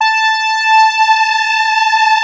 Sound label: FAT LEAD, Powered DX; Wizoo